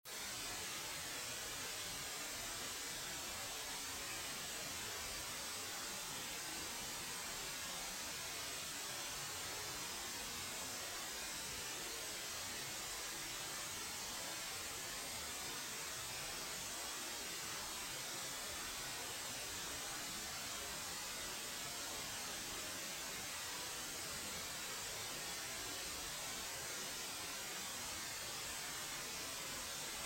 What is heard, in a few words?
mono; 2048 FFT 18dB on mono noise; denoise